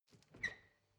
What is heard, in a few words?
refrigerator door open squeak